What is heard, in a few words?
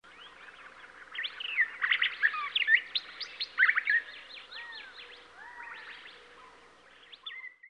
North Woods